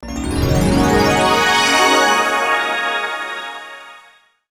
Win

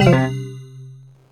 cancel

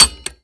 Magic Item Factory Spin Fail; Skylanders Cloud Patrol; Arcade, iOS, Mobile

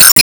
Ball Hit

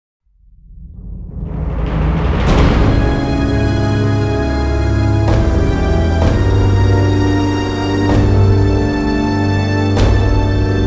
opener catacombs

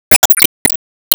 Enemy Weekpoint Expand

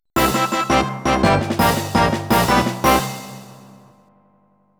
Mini-Game Victory; Mario Party

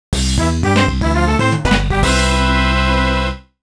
Finished 2nd-4th; Mario Kart DS

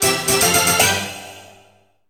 Unknown Fanfare; PokéPark 2 Wonders Beyond; Wii

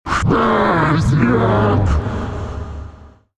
Satan Rise Up

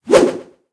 Shield Down; Skylanders Cloud Patrol; Arcade, iOS, Mobile; Troll Shielded SFX

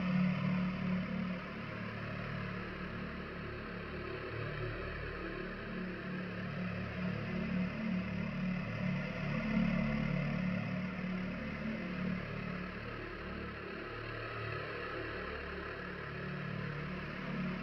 black book acquire hum iddle

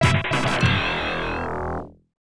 Lottery Lose

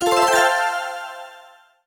Info; SE Sys#01 (SE Sys09 Info)

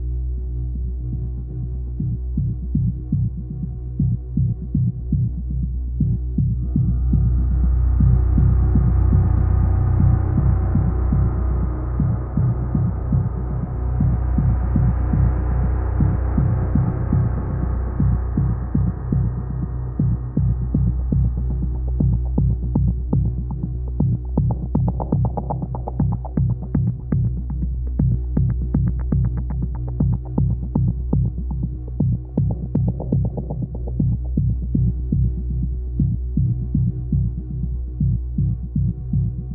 Pad; monster energy supercross the official videogame; ps4 steam switch windows xbox one

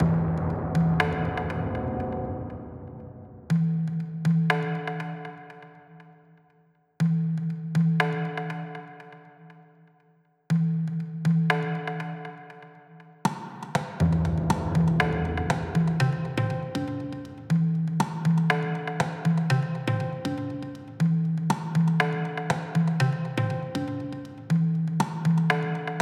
Drums 120

